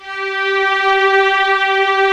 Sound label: COMBO STRINGS; OMI - Universe of Sounds